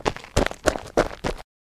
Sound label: Footsteps running on dirt